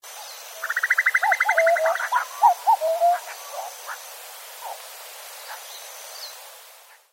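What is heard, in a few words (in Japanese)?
kakko, cuckoo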